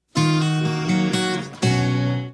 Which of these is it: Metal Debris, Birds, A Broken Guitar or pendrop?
A Broken Guitar